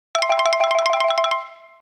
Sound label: line ringtone telephone ring